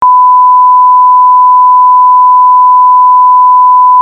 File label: Tone